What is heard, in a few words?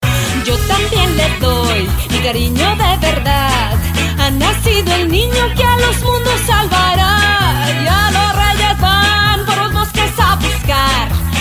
JINGLE BELLS; KIKA